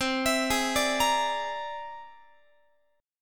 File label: C Augmented 9th, Guitar; arpeggiated; JGuitar